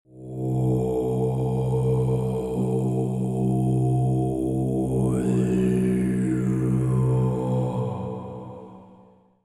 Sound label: Monk Chanting Sound